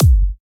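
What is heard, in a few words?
drum kick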